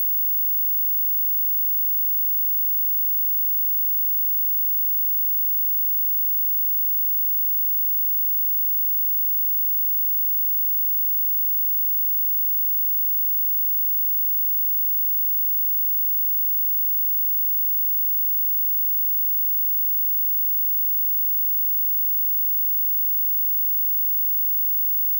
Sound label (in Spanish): Tv Chillido